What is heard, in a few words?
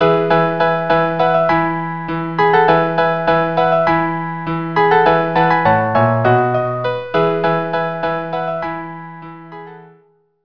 quartet; spring 1